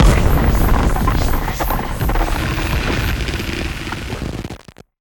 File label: impact; bluezone; fvtt sonniss gdc audio